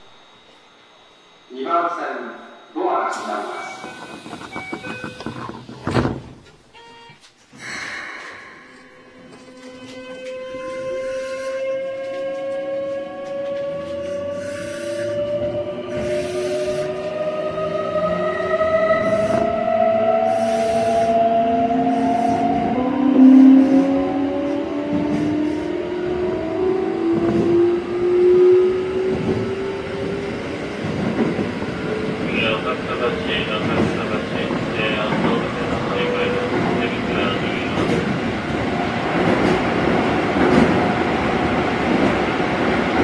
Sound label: vvvf, mitsubishi; keikyu 600